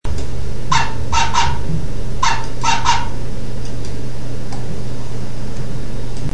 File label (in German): Hundebellen